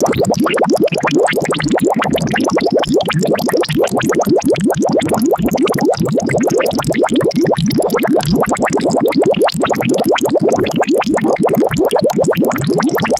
rapid synthesized cartoon bubbling loop; ui

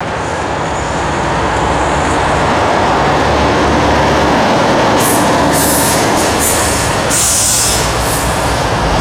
heavy freight truck passing by with air brakes; vehicles

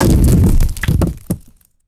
heavy rock impact with scattering debris

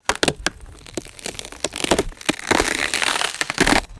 sharp ice cracking and splintering

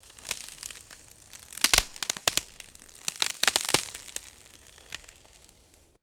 dry branches snapping and rustling; vegetation

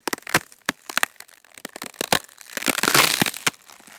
brittle ice cracking and straining

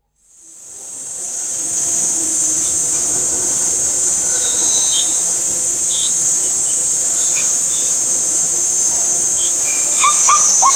gibbon barking calls in forest ambience; animals

